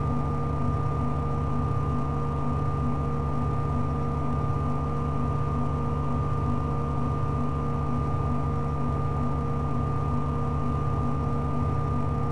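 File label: Ship idle loop; vehicles; queen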